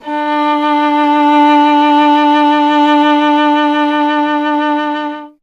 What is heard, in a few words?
viola D; Miroslav Vitous; Solo Instruments VOL-1